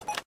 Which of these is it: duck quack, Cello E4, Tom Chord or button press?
button press